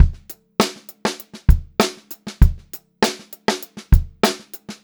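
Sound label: STRAIGHT BEAT, Funk-Groove Drumloops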